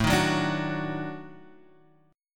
Eb Minor Major 7th Flat 5th

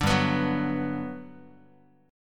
G Major 7th, Guitar; Listen to GM7 strummed; GM7 Chord

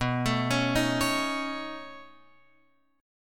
B 9th B down Guitar Open F; arpeggiated

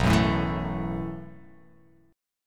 B 7th Suspended 4th B down; B7sus4 strummed; chord shape; JGuitar

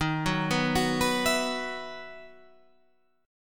E Major 7th Suspended 2nd D sharp

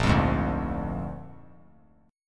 Bb 7th Bb down 3C,3F,3A,3D; strummed; JGuitar

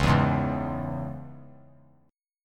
C sharp Minor, chord; C#m; strummed; JGuitar

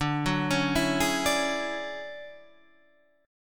D-Major Sharp 11th, D

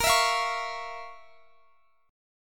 F Major 7th Suspended 4th Sharp 5th Bb

